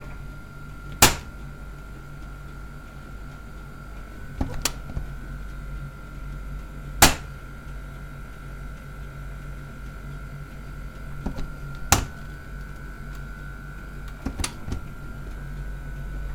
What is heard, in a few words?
Opening Closing Washer Door